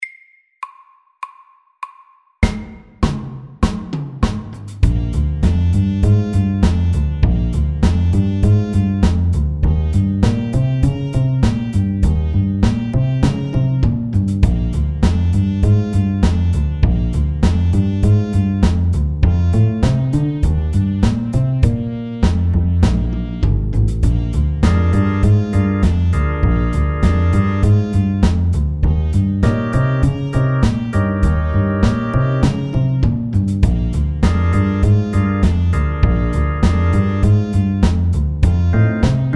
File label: Walking bass FAST 100